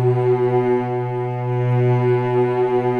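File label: B2, strings; East West; The Ultimate String Collection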